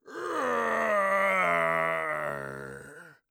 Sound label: bleeding, Orc Voice; Orc Voice Pack